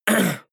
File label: harrumph male mouth open short; koerpergeraeusche; menschen; soundpool